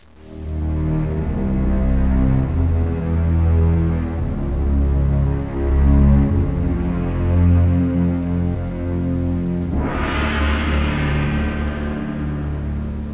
strings

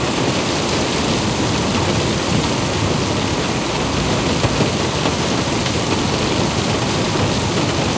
rain on tin roof loop

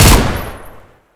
smg fire; deluge; weapons v3

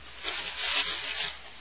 bush

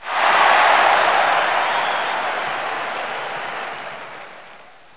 clapping

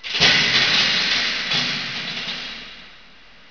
metal

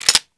30cal handle; svencoop; ww2projekt

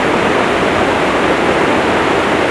waterfall small

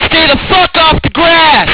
grass, ambience

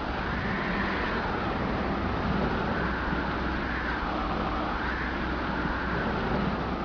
wind

